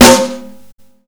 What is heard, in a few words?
Snare Warm, Drum and Bass; EZ Rollers; Instrument Samples